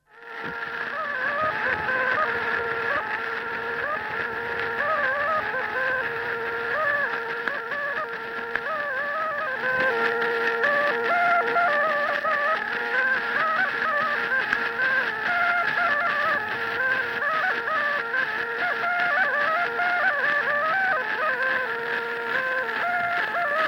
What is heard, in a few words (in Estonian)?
Kupparimuori, Kuusalu, torupill, folk